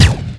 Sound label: PULSE; lander